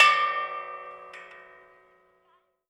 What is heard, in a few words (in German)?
metallklang; klang im schnee